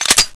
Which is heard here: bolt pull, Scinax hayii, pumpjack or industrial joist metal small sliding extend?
bolt pull